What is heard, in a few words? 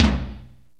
RX7 HEAV TM; Crypton - DrumBase5000